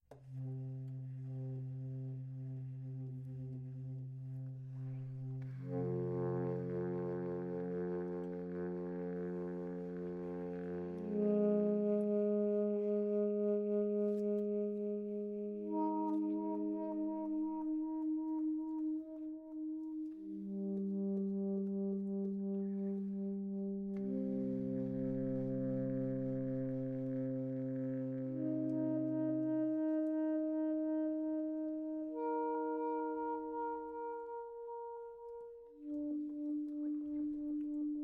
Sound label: Sax quartett; Cesa M